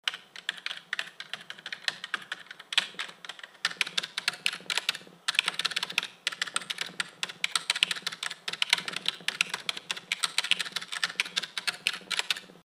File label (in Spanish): teclado